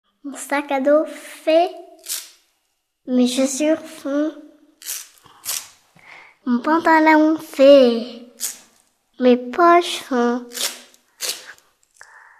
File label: scratch; maternelle theme autour de l'ecole